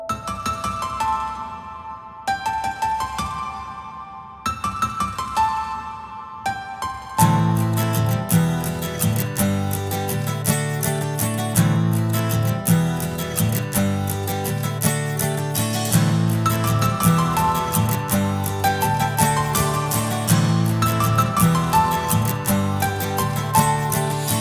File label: INSTRUMENTAL RINGTONE